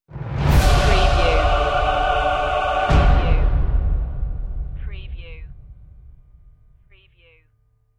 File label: dark force awakens stinger